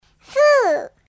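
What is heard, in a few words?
girl blows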